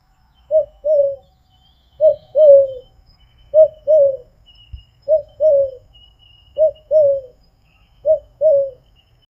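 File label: great horned owl bubo virginianus, owl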